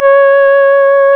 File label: C#, Synclav FM, WIND; Big Fish Audio